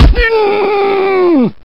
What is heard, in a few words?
pain; slender; shrek